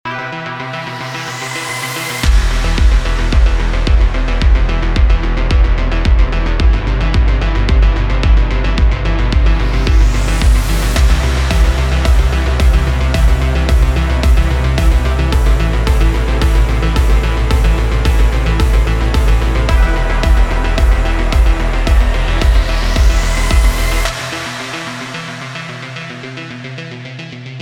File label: trailer logo